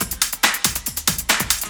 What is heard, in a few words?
Drum Techno